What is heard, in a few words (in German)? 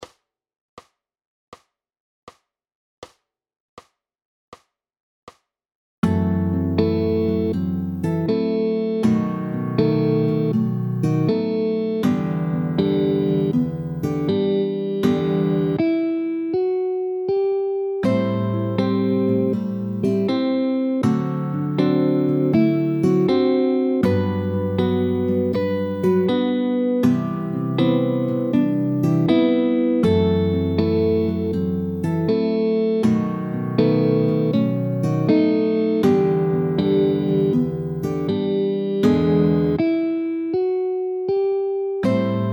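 G-Dur mit shell chords 80 bpm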